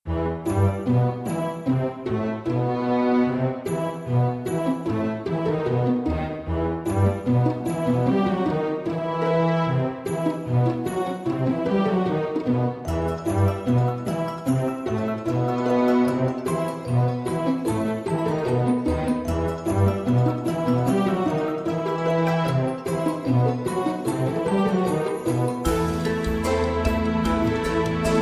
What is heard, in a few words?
Level completed Jingle; Secrets of Magic: The Book of Spells